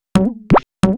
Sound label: attack